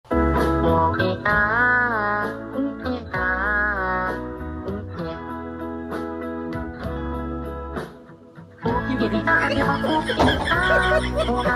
uuuhihaa

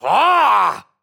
angry sound; angry birds 2 leonard

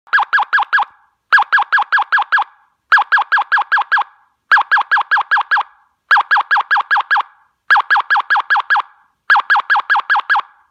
motorola tetra alarm

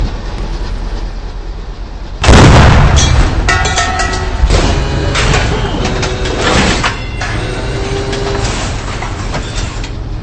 tank reloading

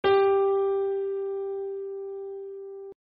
G4 piano note

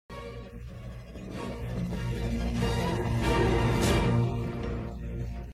suspense; gabbar

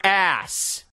ass sound effect

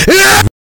eeeaaaa

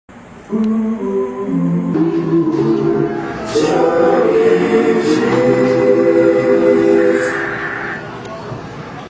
whistle

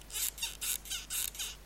slow movement

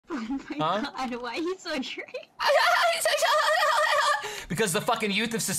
laughing meme; ninja

